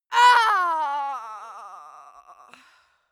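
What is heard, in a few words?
female death, screams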